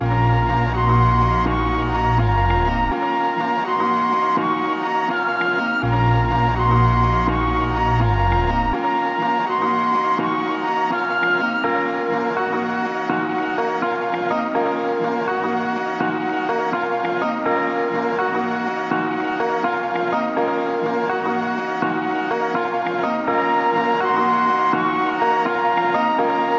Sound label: 165 BPM A# Min, Melodies; Cymatics; 2022 Melody Mystery Expansion